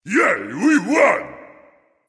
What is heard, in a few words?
nv yay; Smite; ymir skin9